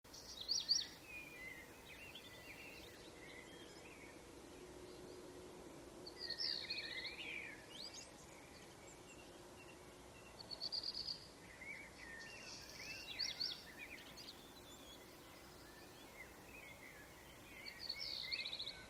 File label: Morning Ambience Sound Effect; smbx38a